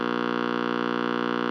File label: AM Radio Buzz; Communications